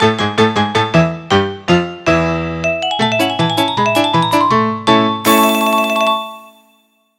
Win; Haunted3

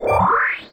tap level

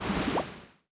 Water

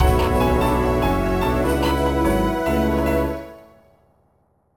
BGM STAGE BATTLE; Phantasy Star Zero

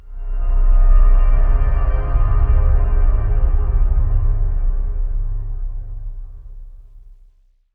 amb organ; gamerip; blackfaun

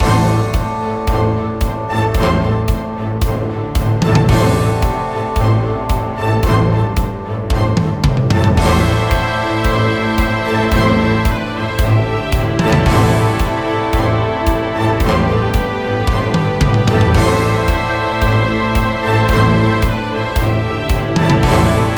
Battle Wendigo; android

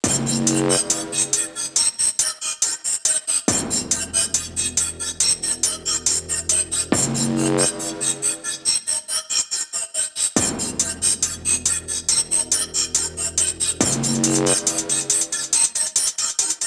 Lock Picking; bully scholarship edition

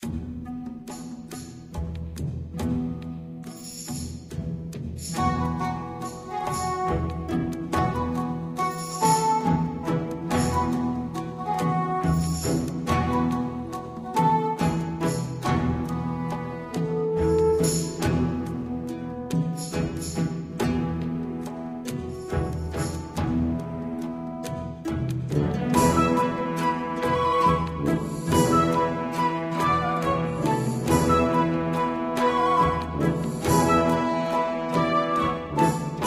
town theme; Tempest Pirate Action RPG

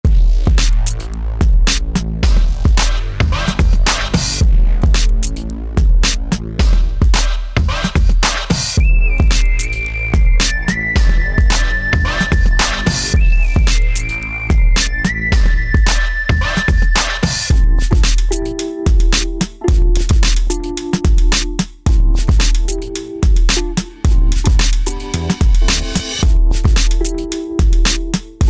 Hip Hop

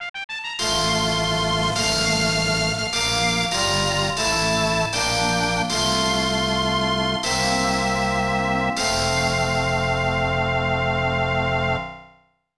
Canadian National Anthem; super batter up; snes

